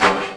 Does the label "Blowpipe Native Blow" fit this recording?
Yes